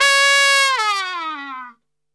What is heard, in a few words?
C#3, Brass; Best Service; Brass Super Section; TRP LNGFLL